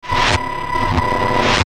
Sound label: Electronic Readout Static Filter